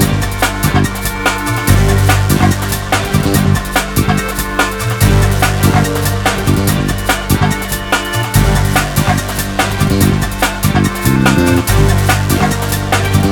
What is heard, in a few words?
boss loop 144bpm; chicory a colorful tale; ps4